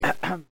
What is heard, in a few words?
beep ahem